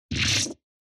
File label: Cut